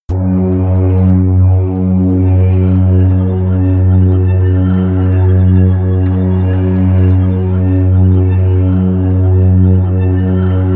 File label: drone apocalyptic bass